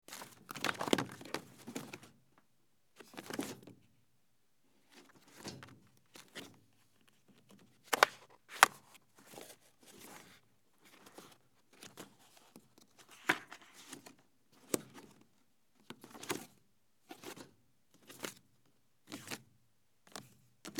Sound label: File Folder